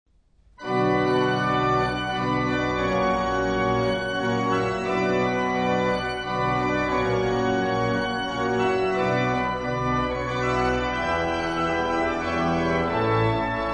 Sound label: Russian and German Organ Music